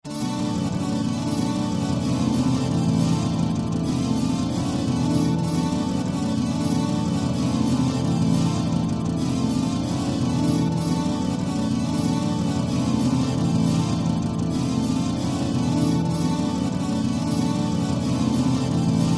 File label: 12 String Guitar loop